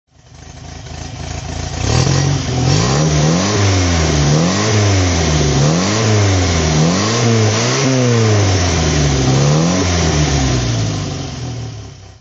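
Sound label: MGA 1600 mk2 throttle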